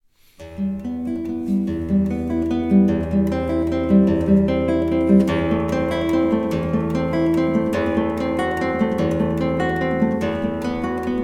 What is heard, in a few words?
prelude; no 9; shearer